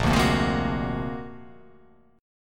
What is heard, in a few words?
Bb Augmented 9th Bb; Bb+9 strummed; JGuitar